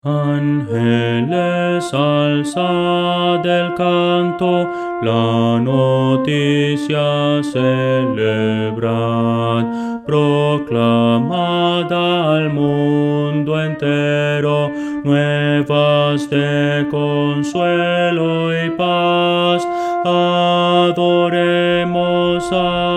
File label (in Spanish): contralto; Voces para coro; angeles alzad el canto; himno; Todo honor y homenaje / tributad al Rey Jesús.